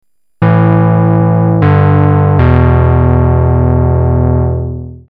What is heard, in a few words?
fifths